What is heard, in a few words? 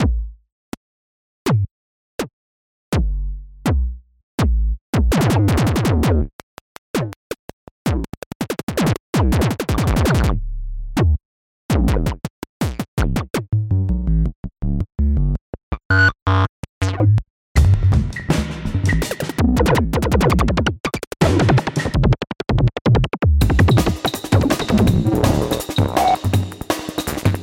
Glitch Brkbt